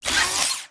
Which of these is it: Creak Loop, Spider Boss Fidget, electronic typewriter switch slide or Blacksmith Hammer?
Spider Boss Fidget